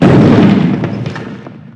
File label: Ice Crash; Barotraumatic